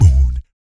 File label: VOC PERC, Vocal Planet Jazz FX BASS PERC